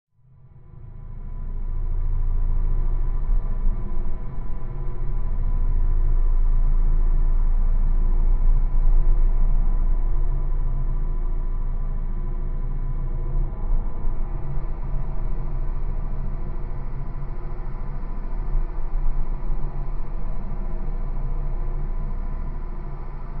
Amb Eerie Room Tone; drones & tones

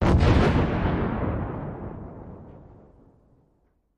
1st Car Blow Up 4 Pipe

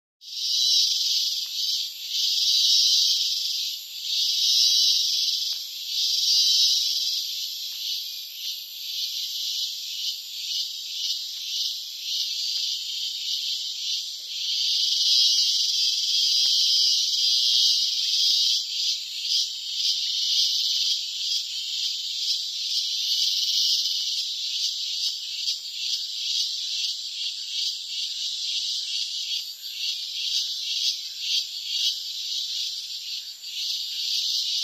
Active Hollow Cicada; cicadas

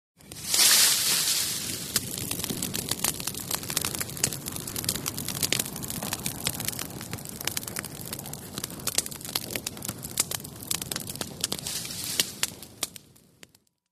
Fire Roars Crackles, campfire exterior

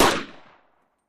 RIFLE SHOT

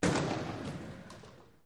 Ice Hockey Hits Impac

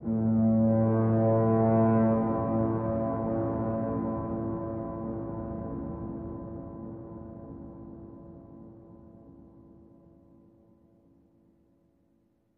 Horn Fog Ambient

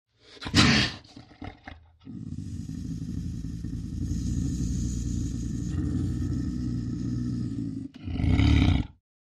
Lynx Snarls Snorts

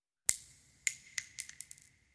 Shell Case Sngle Revb, shell casing movement

